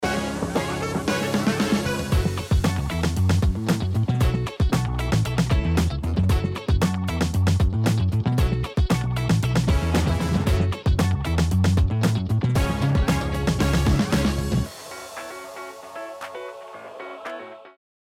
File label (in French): musique energique